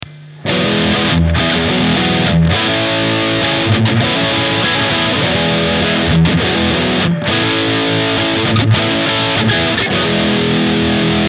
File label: Supercharged Lead